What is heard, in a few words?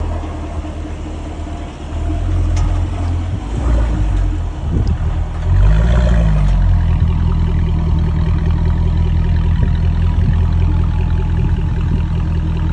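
Jaguar, exhaust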